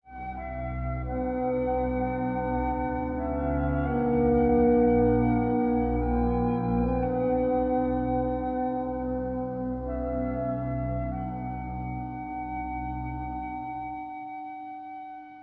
flute, Loops, Sad

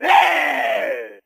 growl, Voice

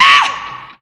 ow reggae; ooh ahh; ow ouch

